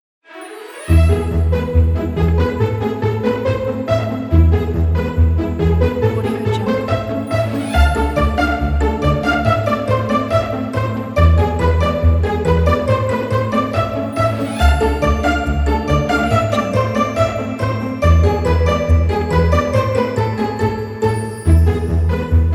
russian folk orchestra; kolokol

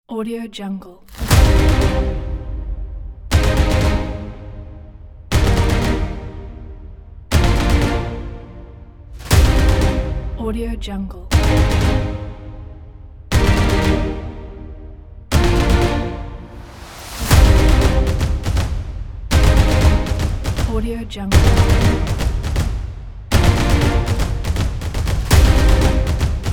emotional cinematic inspiring motivation trailer; starmusicproduction

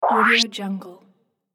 whoosh

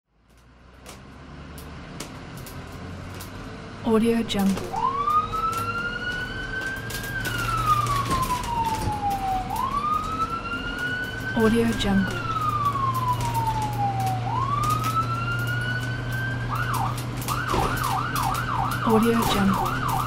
ambulance ride inside

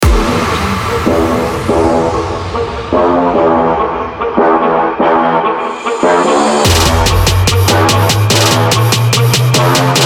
trap like; cheasysound

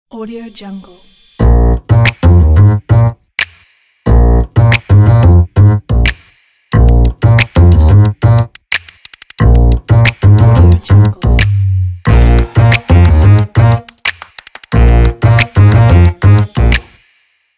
banner logo

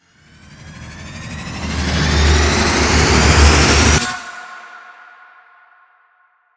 riser

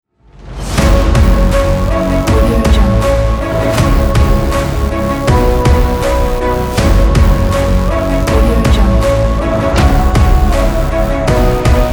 epic action sport rock adventure

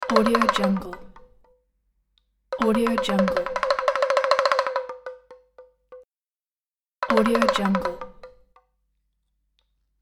chinese hand drum

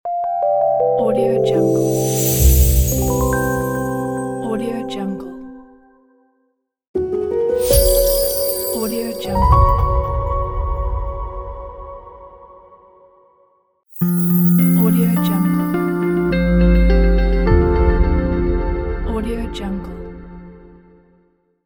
simple logo reveal pack

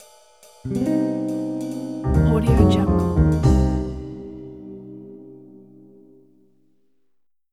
jazz logo; bluejaystudio

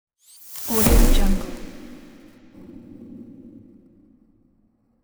machine sweep transition

